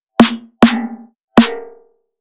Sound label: Three Snares; M8